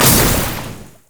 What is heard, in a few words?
explosive cask; LoL; classygragas